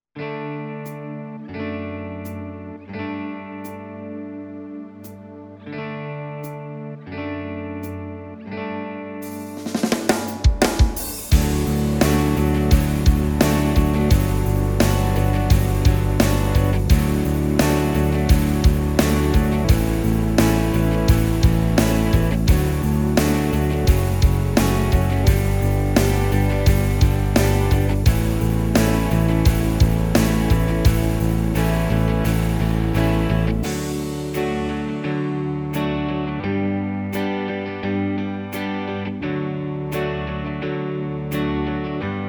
Yamaha PSR SX700 SX900 Style Grunge Rock

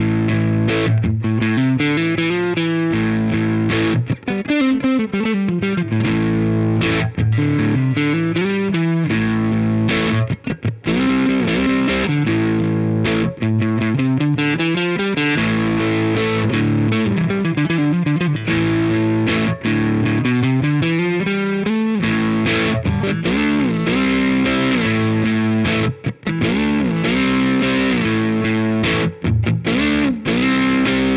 Tsakalis AudioWorks Room 40 Singlecoil

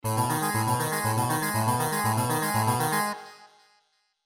g2x ARP

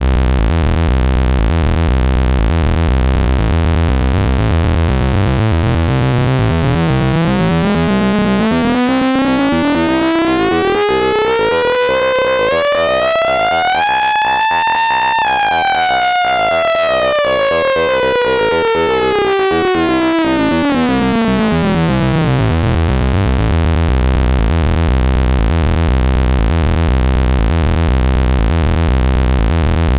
Moogerfooger Synth Simple Fb Bass Freq Sweep

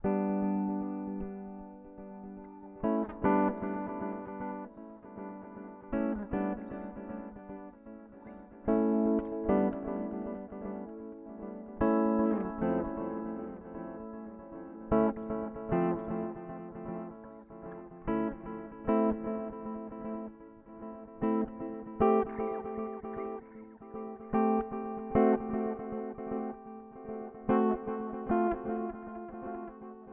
Timeline Stereo Pattern; Strymon

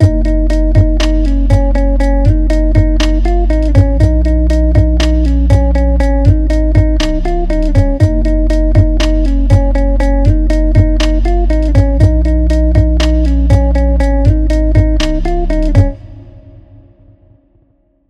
Animate Punch Baseline

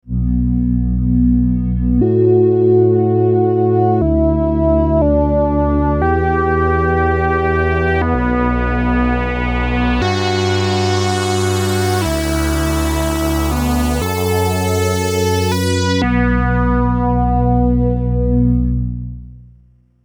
Dark Energy Pad 6x Layer

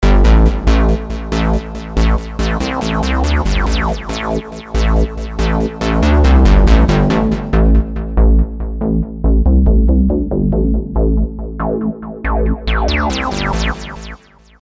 Bass 3 Osc

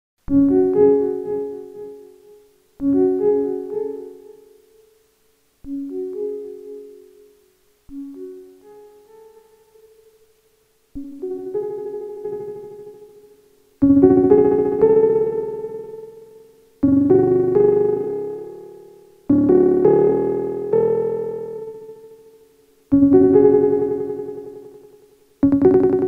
Microfreak piano 2 hit engine

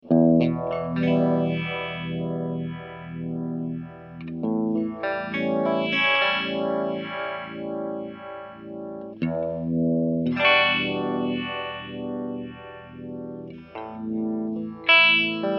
phaser full depht speed

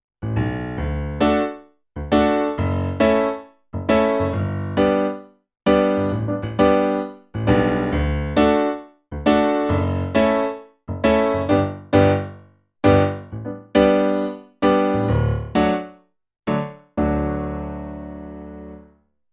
Roland FP 60 Bright Piano

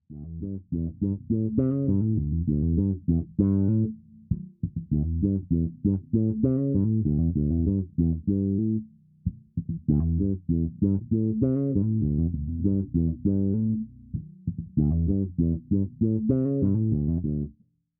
Zoom B2 Four Dragonball

